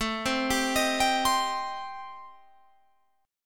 A-Minor 7th Flat 5th, Guitar Open F, chord; Am7b5; arpeggiated; 21,19,19,22,22,19

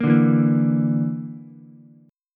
Bb Major Flat 5th Bb down Ukulele, chord; strummed; JGuitar

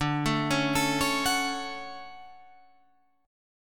D Major 7th, Guitar, Drop D

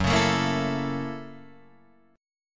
Gb 7th Sharp 9th Gb Guitar Open D

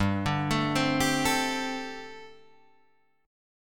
G Suspended 2nd Suspended 4th G, Guitar, Drop D

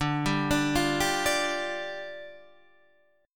D Minor Double Flat 5th, D, down, Guitar DADGAD

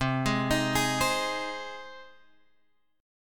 C Minor Sharp 5th C Guitar Drop D

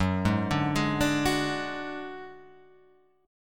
F sharp Suspended 2nd Sharp 5th

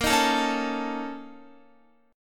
Bb Minor Major 7th Double Flat 5th, Guitar; BbmM7bb5 strummed

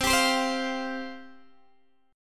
C Suspended 4th C Guitar DADGAD; down; chord shape

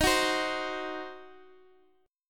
C Diminished D sharp, chord

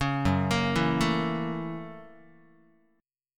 Gb 7th Sharp 9th Gb Guitar; Listen to Gb7#9 arpeggiated

